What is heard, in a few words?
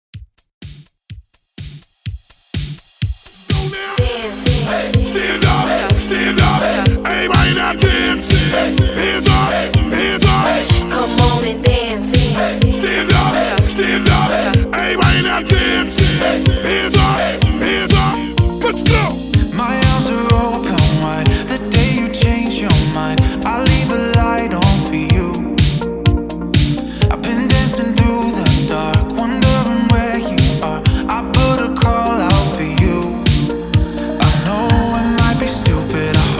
HYPE REDRUM 125 BPM